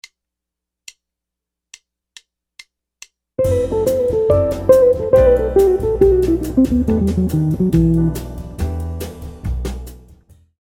brazilian jazz licks, guitar

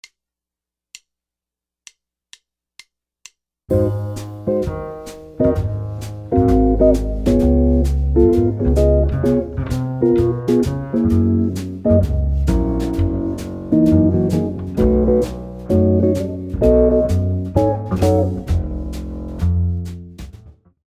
rhythm changes, jazz guitar

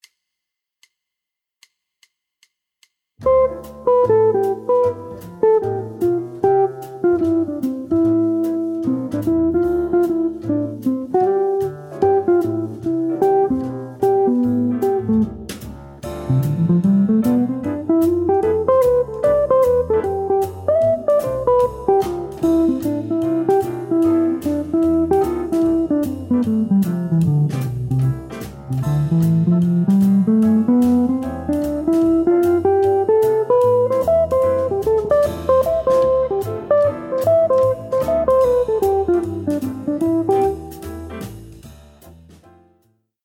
jazz guitar scales

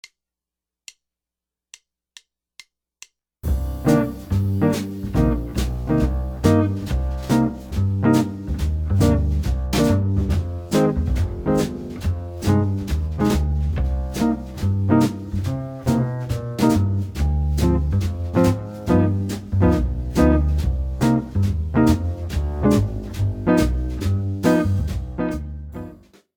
minor blues chords, guitar

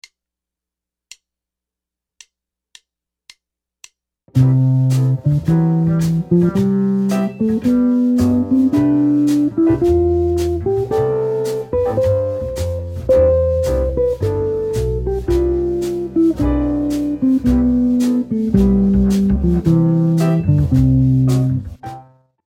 Scale Rhythms, guitar

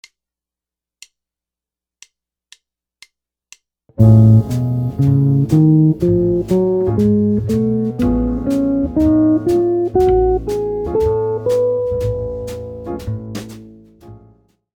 melodic minor modes, guitar

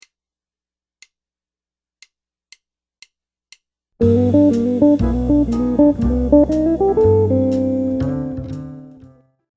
lick, jazz guitar

